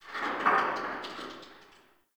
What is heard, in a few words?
Fort Corner Tower Stone Creak; Ambience